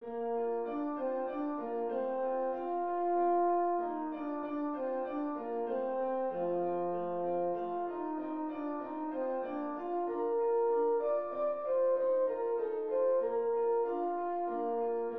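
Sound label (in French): danse allemande, si bémol majeur; haydn joseph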